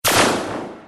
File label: Enemy Laser Shoot; The Powerpuff Girls - Mojo Mayhem; NextlevelGames